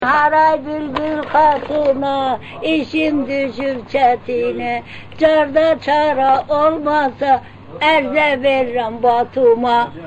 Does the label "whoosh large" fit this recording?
No